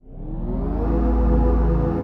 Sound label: heat shields on; ships; combat; CosmicRage